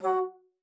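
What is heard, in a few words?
F#3, bass flute; Xsample VOL-2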